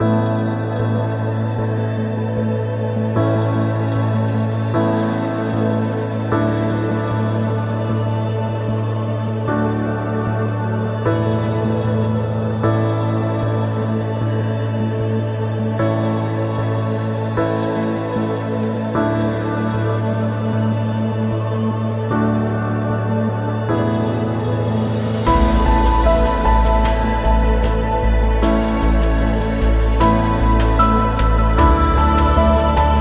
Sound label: Cinematic Ambient